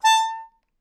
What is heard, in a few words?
Melodica Short; Short Notes Chromatic